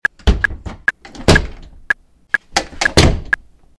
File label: Door Slam